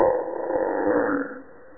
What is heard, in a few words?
zombie barn idle